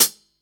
foot cymbal c key, Royality free foot cymbal tuned to the C note. Loudest frequency: 8557Hz; hat; drum single hits one shots; hat samples